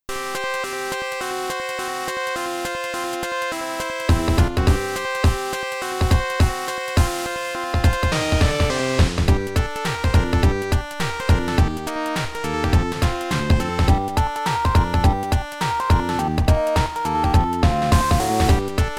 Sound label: Internal Percussion; Cave Story Beta